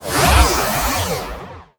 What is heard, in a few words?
Device Activated; Neutron